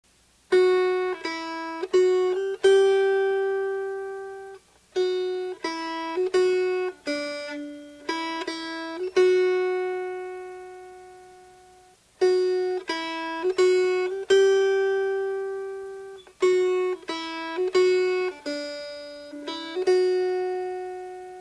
Melody

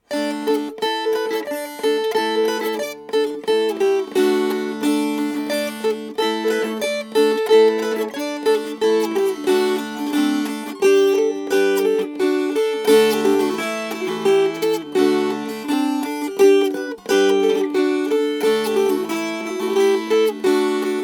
Processional, Fast

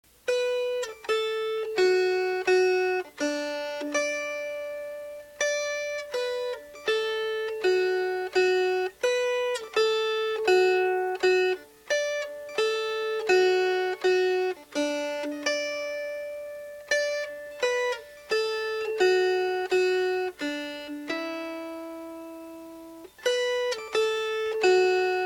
Melody; Miss Welch

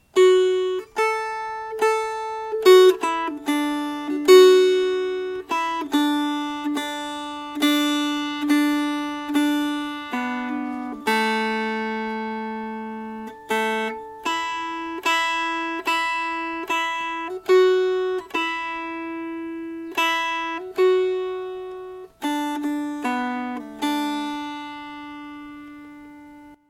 Omie Wise Melody

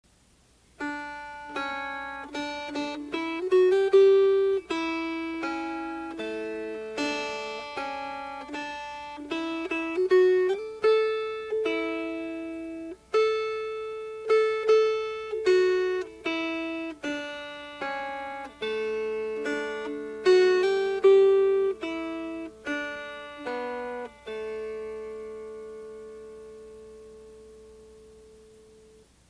William Taylor Melody

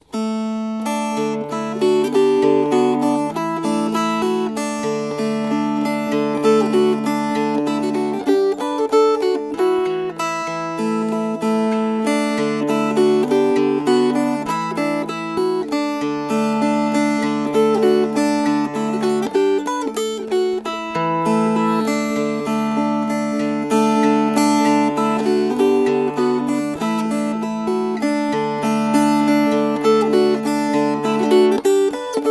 Fingerpicked

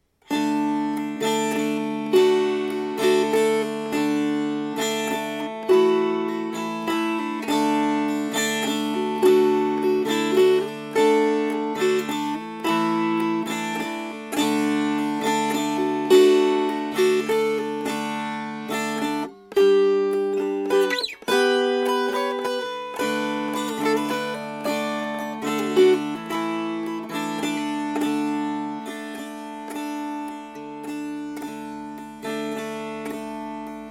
Strummed; Hush Ye, My Bairnie

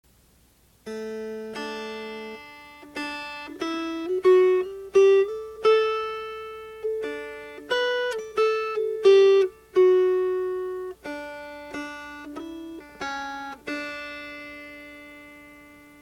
Melody; Mary Hamilton

